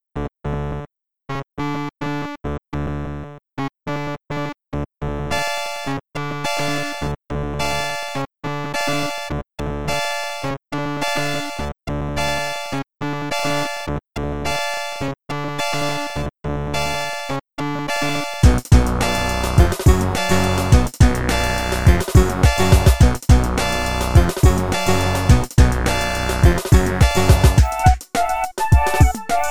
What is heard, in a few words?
random funky classic